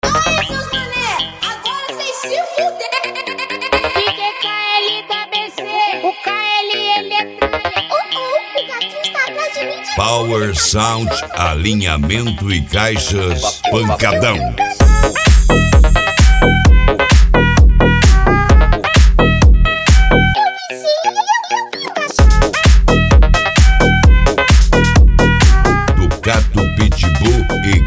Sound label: pancadao; Power Sound Alinhamentos e Caixas